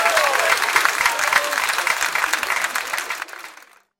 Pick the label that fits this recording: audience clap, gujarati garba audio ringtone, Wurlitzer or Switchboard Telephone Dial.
audience clap